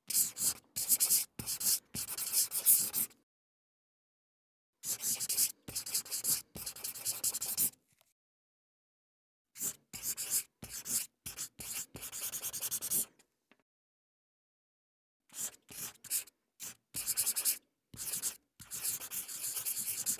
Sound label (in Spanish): Rotulador Lapiz